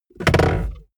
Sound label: car bmw e46 interior center console open hard